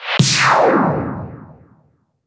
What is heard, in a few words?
Fanfare - Level Up!